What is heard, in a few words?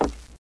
wood; echoes